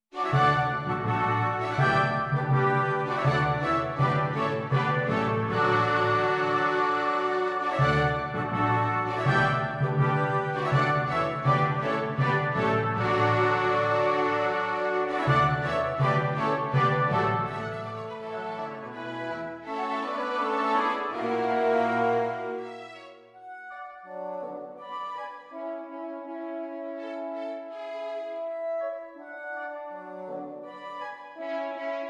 Orchestrazione; Gerd prengel

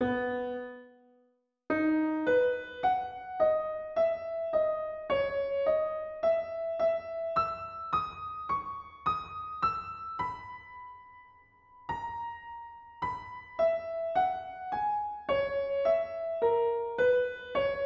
sonata in mi maggiore per pianoforte

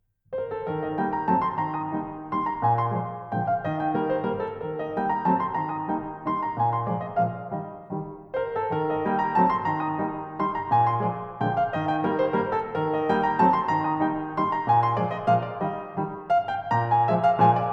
Valzer, Orchester; von Franz Clement; Orchester Director

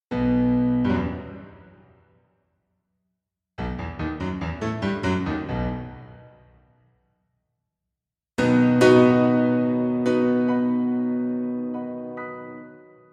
Allegro molto vivace e con fuoco per pianoforte; Kuffner Joseph

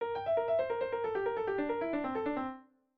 Figura per pianoforte; Figura non troppo leggibile

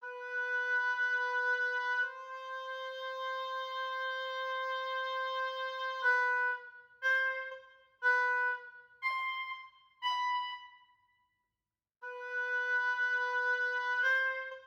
Composizione di sol maggiore per orchestra Parte per oboe; di Beethoven

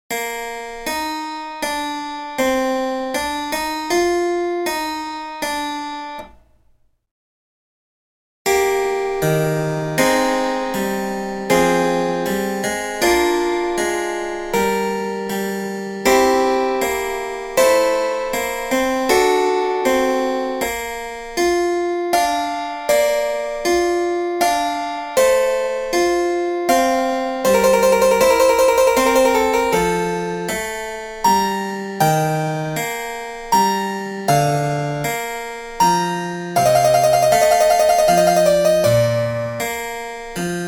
Fuga per il cembalo o l organo

